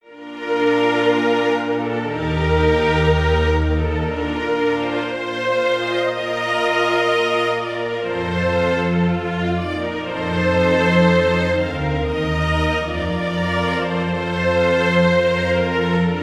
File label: String Quartet; quarteto de cordas